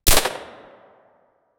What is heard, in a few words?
single mp5 9mm gunshot